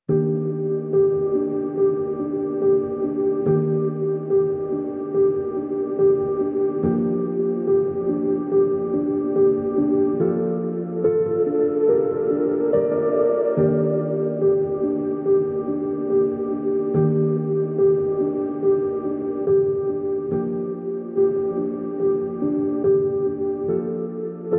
Piano Only

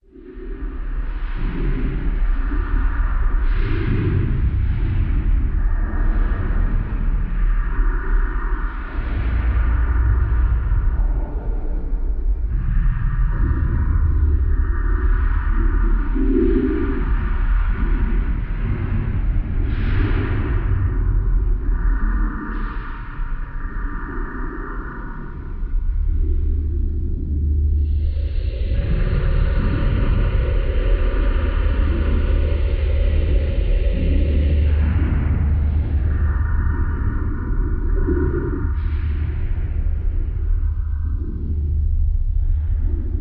Troubled Past Ambience

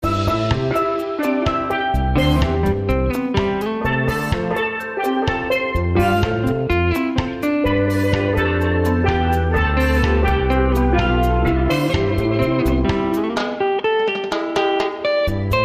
Calypso

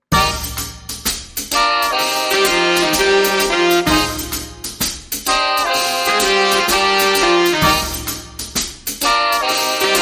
Horns & Drums

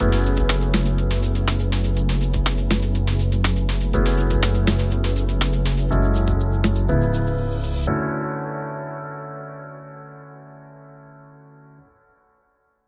Sting

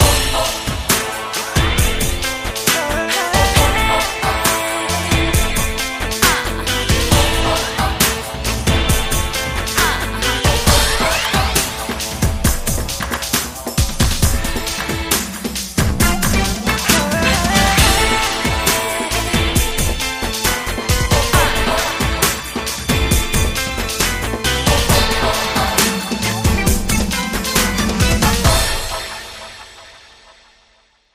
Slam Girls Beat

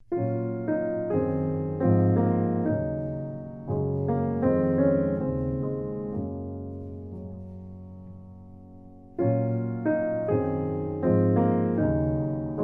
Solo Piano

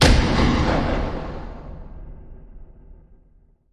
Flat Hollow Boom Impact

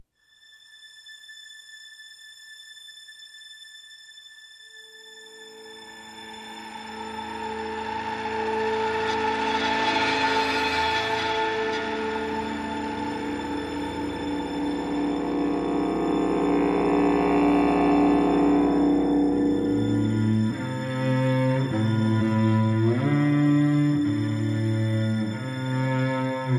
No Percussion No Bass

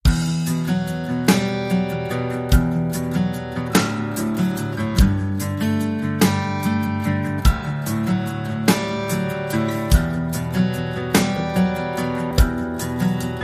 Acoustic, Rhythm

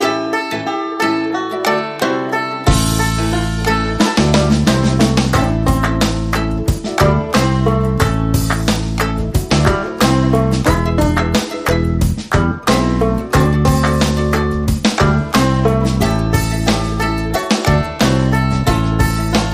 Banjo Blues

